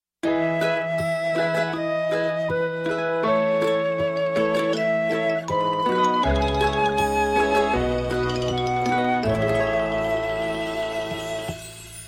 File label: Raindrop; meloboom